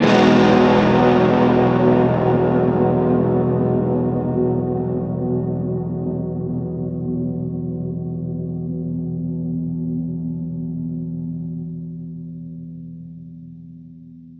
Guitar Chords Emaj; Zero-G - Future Wave

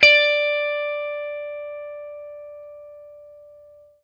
FENDER HRD, Guitar & Bass; Quantum Leap

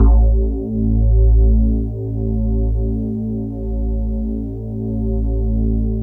E-HARP, DX HARP; Wizoo - Powered DX

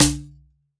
drum, mixed drums, World Instruments

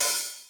Hihat Opened, Korg KR-Mini, Drum Machines; 300 Drum Machines

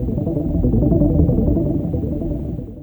Ambient FX, Ambience FX

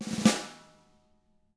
SNARE ROLL; ILIO; Double Platinum Drums 1; SNARE ROLLS

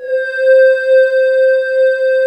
C4, Choir Acoustic & Synth; USB Soundscan vol.28 - Choir Acoustic & Synth; AKAI; VOCOSYNES

